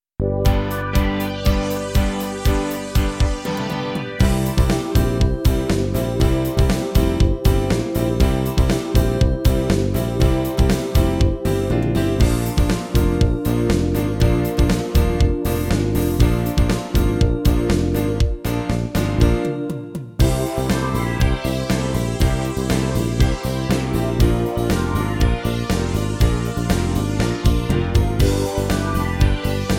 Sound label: Rock Latin; rock basics